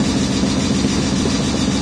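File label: ambient air pump; hellhole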